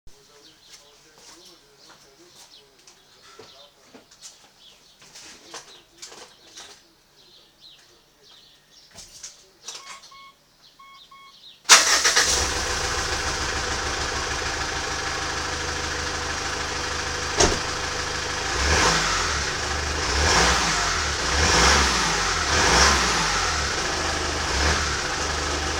exhaust

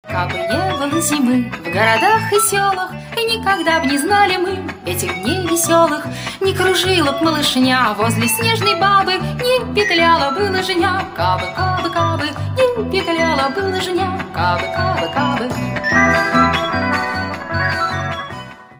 lalalay

